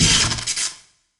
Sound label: Electro Bolt Shoot